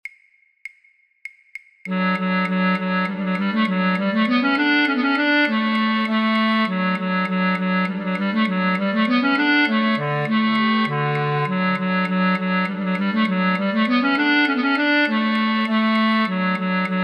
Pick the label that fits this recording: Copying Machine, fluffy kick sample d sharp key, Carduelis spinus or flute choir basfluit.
flute choir basfluit